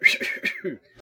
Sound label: Ninjastar pain